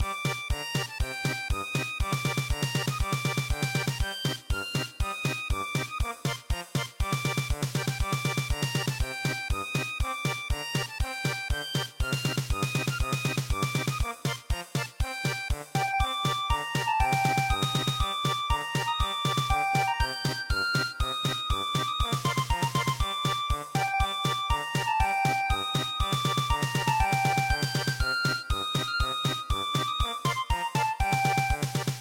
German Polka, midi traditional

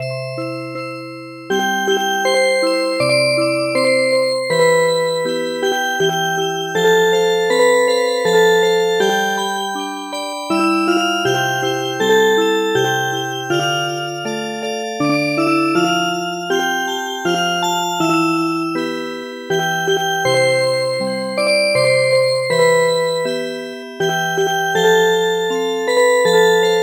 Music Box, midi

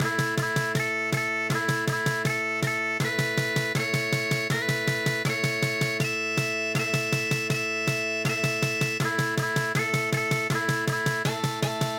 bagpipe; scotlands burning

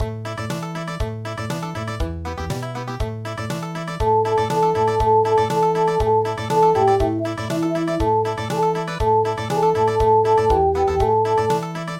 Country, traditional; Tumbler

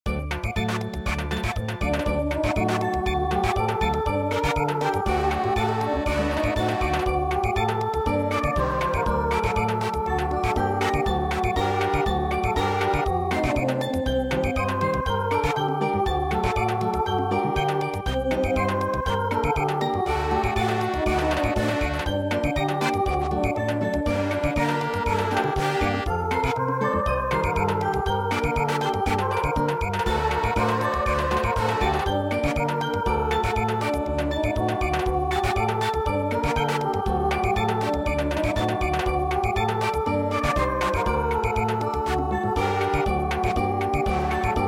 Samba

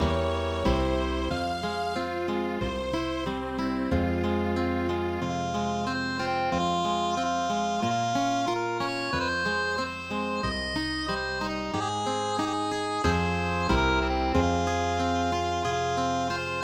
Swedish Cradle Song

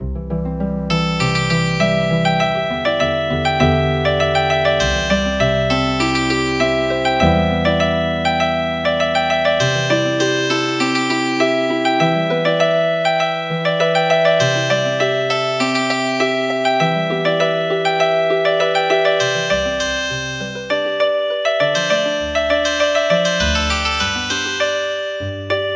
Healin Harp

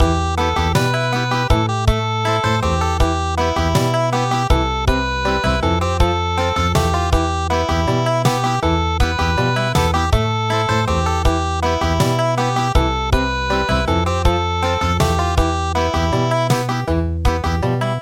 Country, midi; Abu abu abua

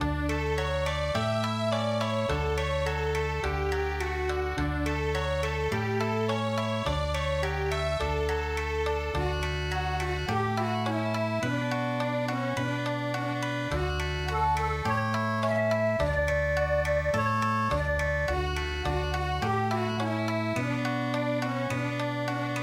Okinawa

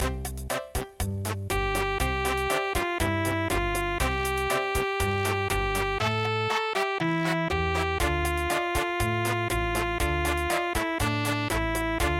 chachacha

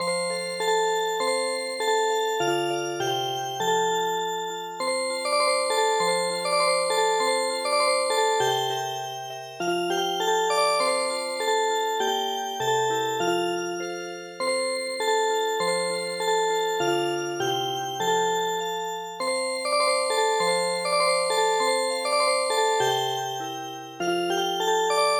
Music Box, traditional

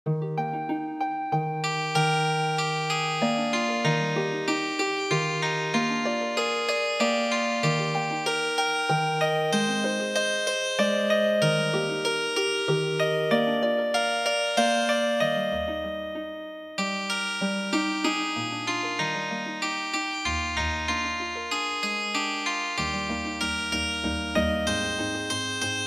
Healing Harp

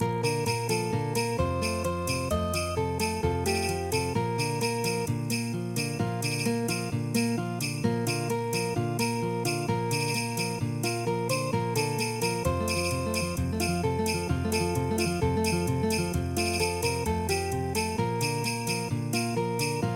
flamenco; La Raspa

